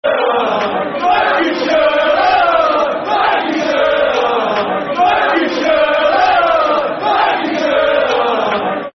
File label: Lancashire La La La [Football Chant (Soccer Songs)]; Preston North End Pne